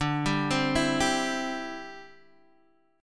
D 9th Suspended 4th D down Guitar DADGAD

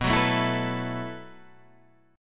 B 7th Suspended 4th B

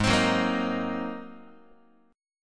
G sharp 9th G sharp Guitar; G#9 strummed; JGuitar

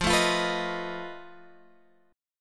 F 7th Flat 5th F down Guitar Open G

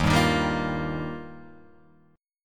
D sharp Major Add 9th

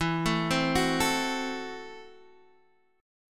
F sharp Minor 7th Flat 5th, guitar

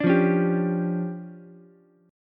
D Minor Major 11th, down, Ukulele, C6; DmM11 strummed; JGuitar

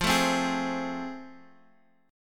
A Minor Major 7th Sharp 5th, F

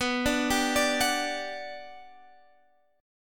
G Major 7th, B

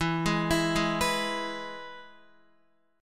E-Major E, Guitar; arpeggiated; JGuitar

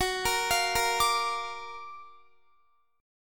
F sharp Augmented Major 7th F sharp Guitar; arpeggiated

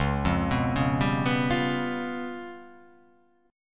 C sharp 7th Flat 5th, chord

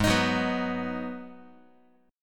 Ab Minor 7th down Guitar; strummed; JGuitar

